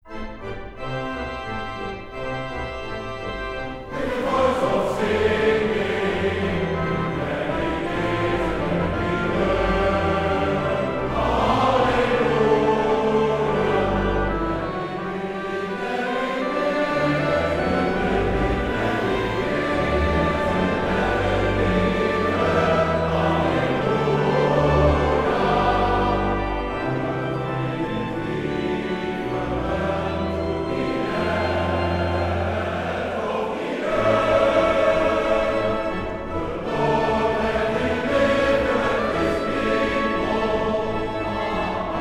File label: With a voice of singing